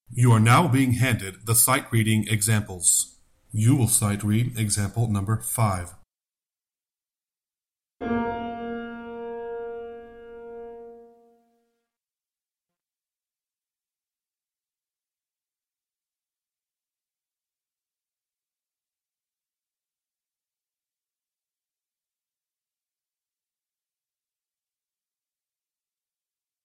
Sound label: Sightreading Example Tenor